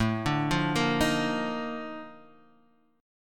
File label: Bb Major 11th A